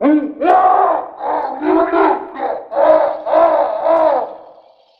monster; Animals

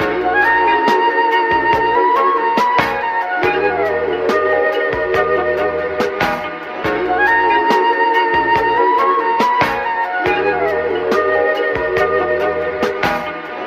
full flutey, Loops Phonky

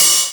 Hats Electro Open

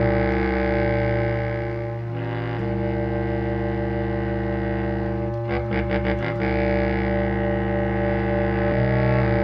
sax doom and gloom; Loops; Sad

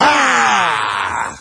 ha; Voice; ooh ahh

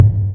zarter, Toms Electro

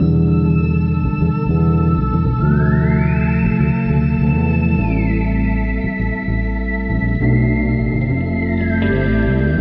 keys

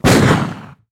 gun shot; Crash Bang Boom

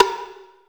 Snares Soft

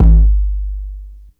boom conga, kicks heartbeats

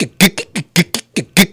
chewing gum, Beatbox, Drum loops

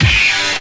punchy

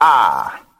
"ah"

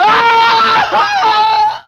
scream

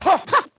ha ha, ooh ahh

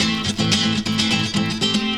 guitar fingersnappin, Loops

